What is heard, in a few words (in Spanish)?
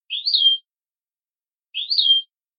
quejas simples